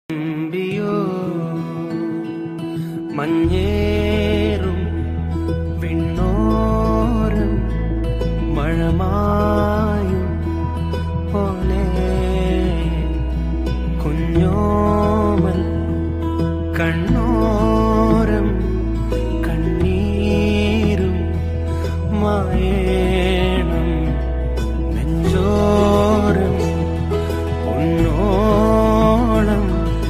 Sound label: sad alone emotion bgm